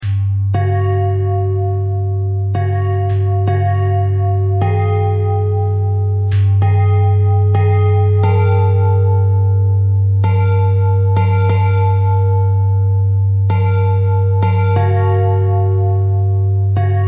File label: bell thread pentatonic